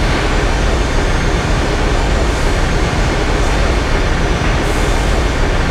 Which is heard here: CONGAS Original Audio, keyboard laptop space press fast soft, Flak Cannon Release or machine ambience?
machine ambience